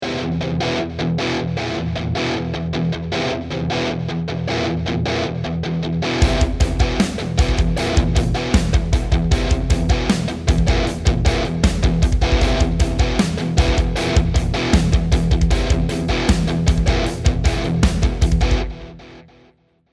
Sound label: backing